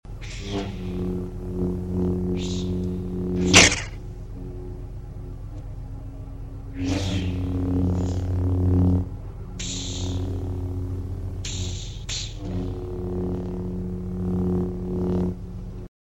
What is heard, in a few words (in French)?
Bruitage - Sabre Laser; Star Wars